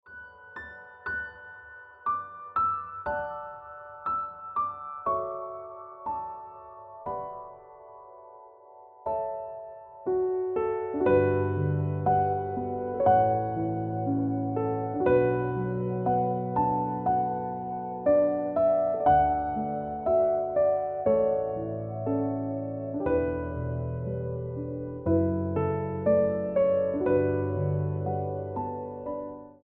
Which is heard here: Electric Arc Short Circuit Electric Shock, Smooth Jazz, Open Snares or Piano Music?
Piano Music